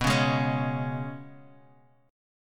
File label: Ab Minor Double Flat 5th B